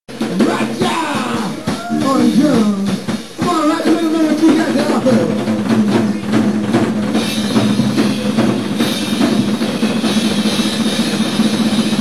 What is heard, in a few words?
drums all